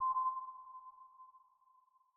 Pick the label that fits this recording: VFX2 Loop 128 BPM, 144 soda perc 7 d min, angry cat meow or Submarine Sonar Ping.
Submarine Sonar Ping